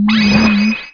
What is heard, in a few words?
Fire up; Gex